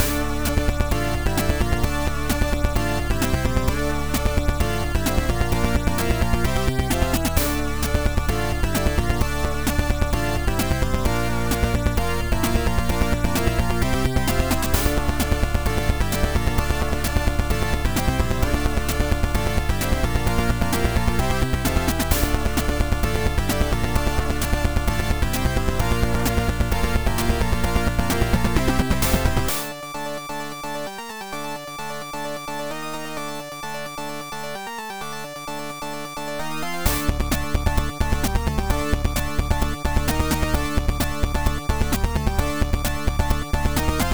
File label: loop